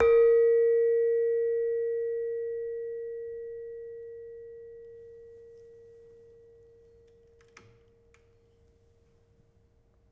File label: Demung soft, gamelan